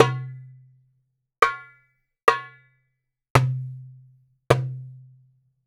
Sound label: Raw Perc Darabuka